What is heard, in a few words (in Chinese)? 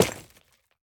滴水石锥落地声